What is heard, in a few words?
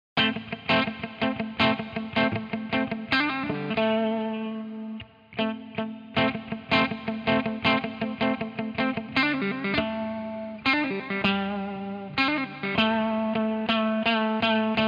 les paul wet; chiqui audio